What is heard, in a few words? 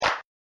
clap